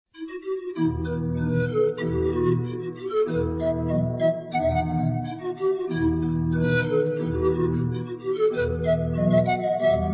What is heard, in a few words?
Tambourine